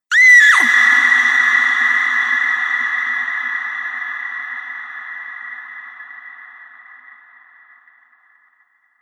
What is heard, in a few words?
Scream Cathedral Drone; Drone Sound Effects